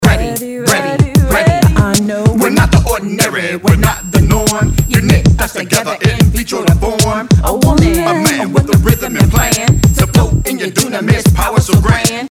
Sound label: vocal snippiet